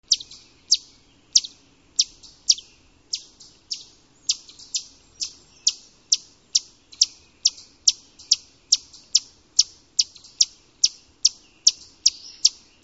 Chipmunk Sound

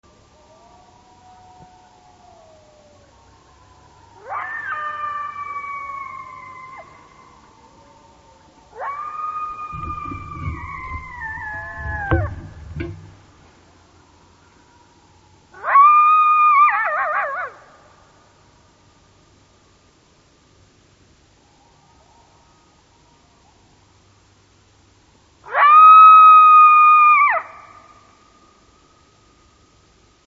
Coyote Sound

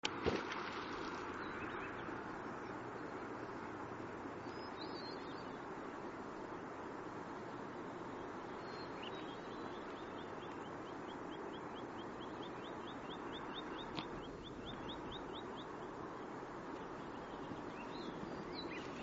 Beaver Sound

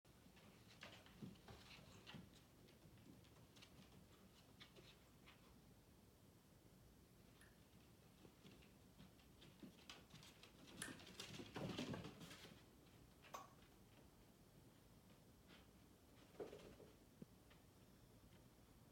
raccoon movement noises in attic

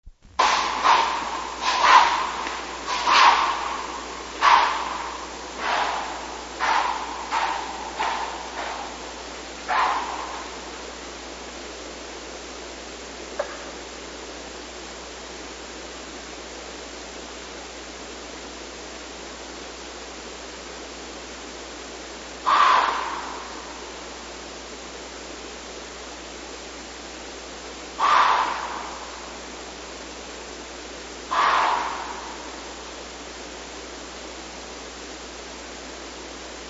Deer Sound

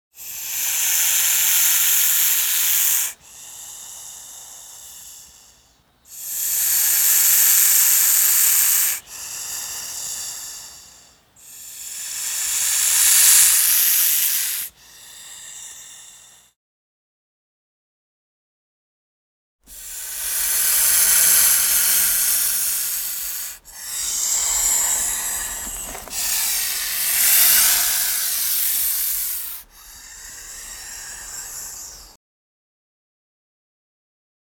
Snake Hisses with Inhales

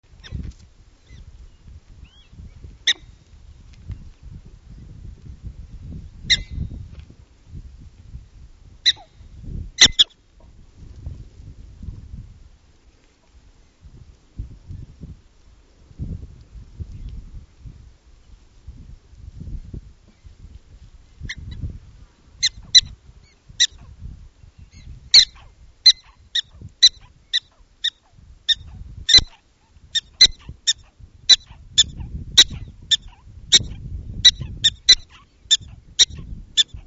Prairie Dog Sound

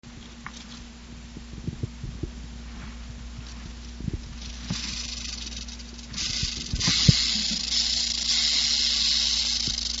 Snake Sound

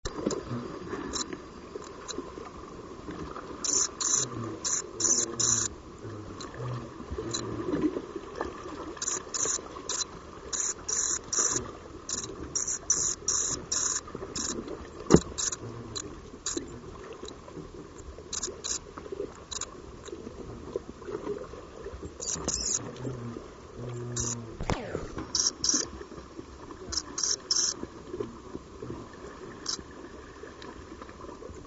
Bat Sound

